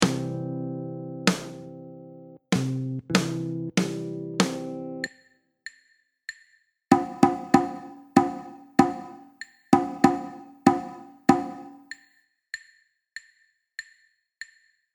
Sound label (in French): rythme, guitare jazz